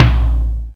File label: tom lo; Korg; DDD-5